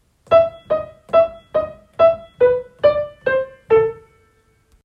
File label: fE staccato